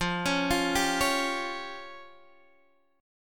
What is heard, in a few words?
F Suspended 2nd Sharp 5th F down Guitar Half Step Down